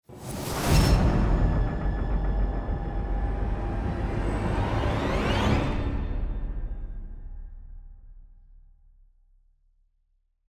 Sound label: Combat FAIL; batman arkham origins; android ios